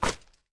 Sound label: Net Break; doodle jump 2; android